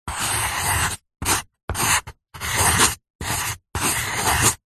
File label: office pencil drawing on paper; zapsplat